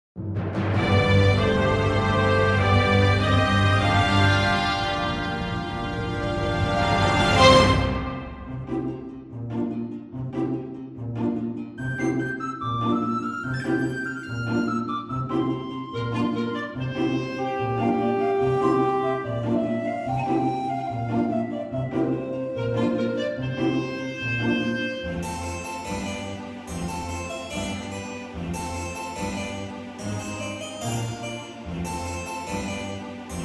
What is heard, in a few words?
reorchestrated; Ocarina of Time